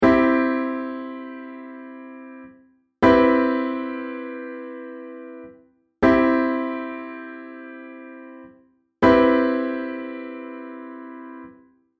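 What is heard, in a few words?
Guitar C CM7 piano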